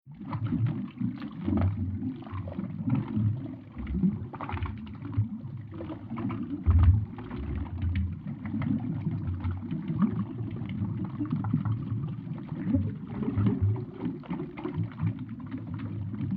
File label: SciFi Underwater Thick Heavy Liquid Sludge Alien Ship Space; Sound Design; Science Fiction Sound Effects